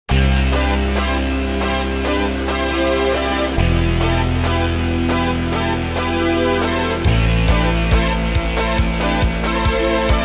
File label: Rock